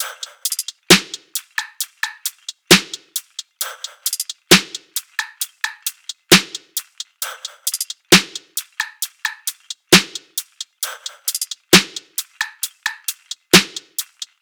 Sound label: tops 133, Drum Sample Pack, Top Loops; SD SEISMIC; Slate